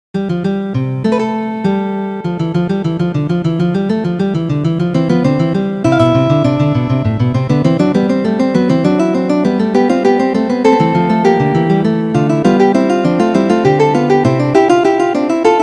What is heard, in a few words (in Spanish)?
fuga; bach johann sebastian; bwv0870; clave bien temperado 2